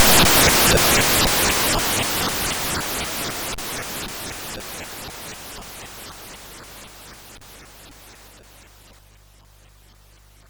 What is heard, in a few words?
Frantic, MOS6581R4